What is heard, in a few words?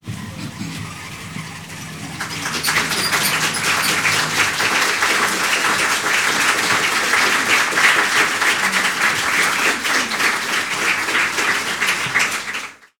Theatre Courtain